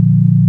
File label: sine; ものづくり基礎工学（情報工学分野）; 音の波形と分析